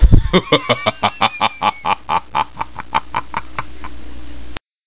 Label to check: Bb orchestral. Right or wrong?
Wrong